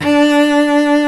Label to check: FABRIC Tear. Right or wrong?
Wrong